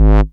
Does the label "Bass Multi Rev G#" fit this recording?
Yes